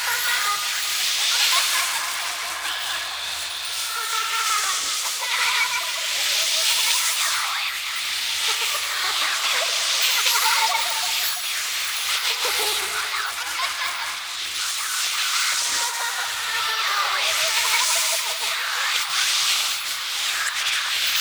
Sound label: Globe Light Ghost Loop; Gameplay; Warframe; Zariman